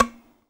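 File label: TZRS PIZ C 4, tzouras pizzicato; Soundscan vol.53; Mediteranean Traditions; AKAI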